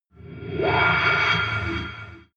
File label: alien metallic scream buzz reverb; aliens